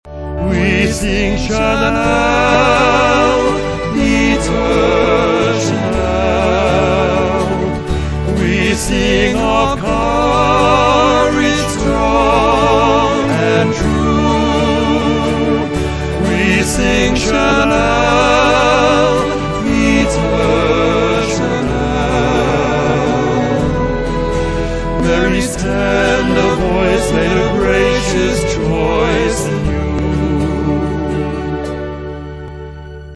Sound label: chorus